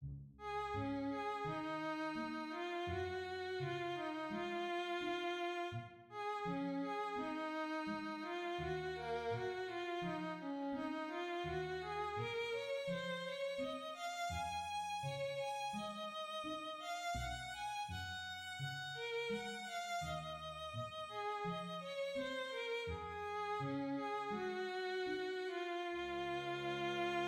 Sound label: orch